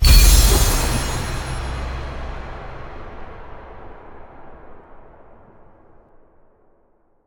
super Mega Big Win Hit; riches of midgard

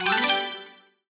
attention Sound; magic maid cafe

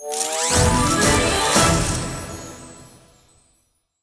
big win, roulette

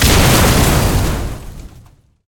exploding Car; narcos

